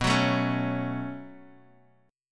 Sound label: B 7th Suspended 2nd B down; B7sus2; strummed